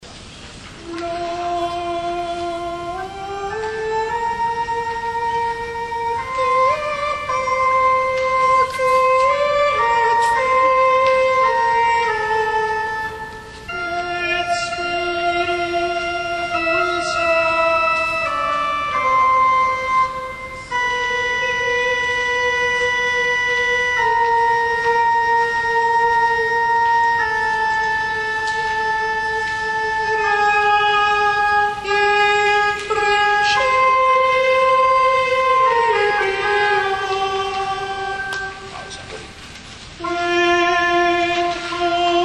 Soprani; Mozart Vesperae Solennes De Confessore; laudate dominum

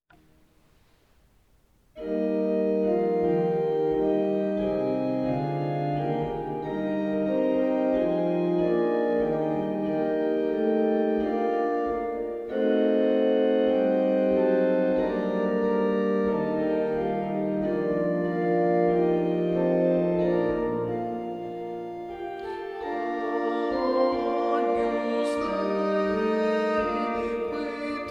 Agnus dei. Soprani e tenori, coro; Coro Isola del Giglio; Messa di Bottazzo

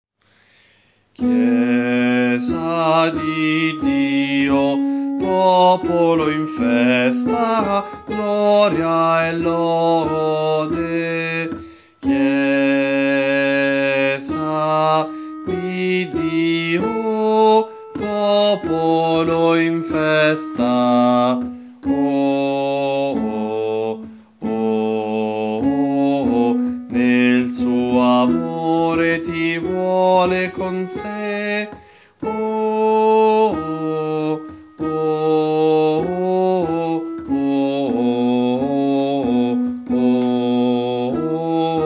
Contralti; Coro Isola del Giglio; Giovedi Santo

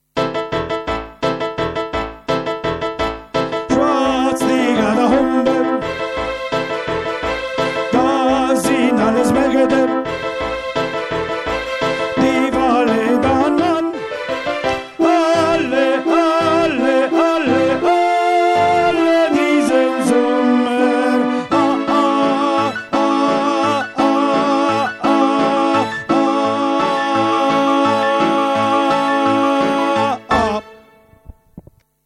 tenori 2, coro; Carmina; Swaz hie gat umbe

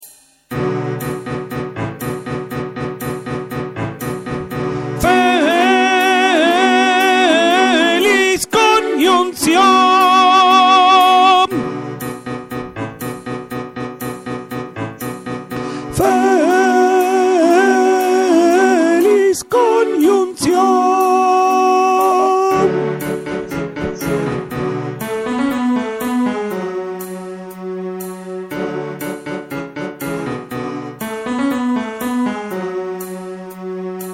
tenori; Carmina

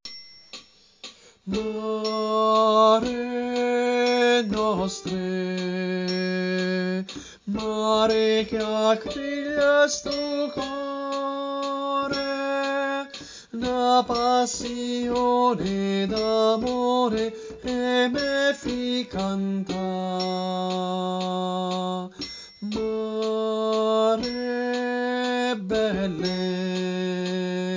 Soprani, coro; Coro Isola del Giglio; Mare nostre

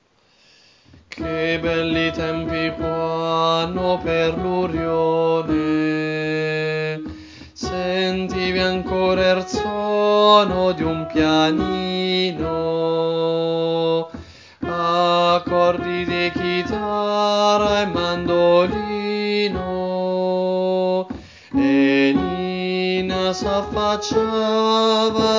tenori, coro; Coro Isola del Giglio

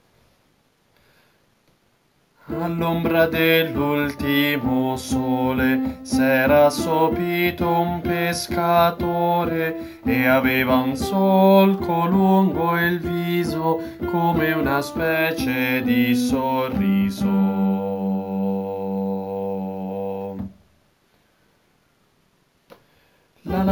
contralti, Coro; Coro Isola del Giglio

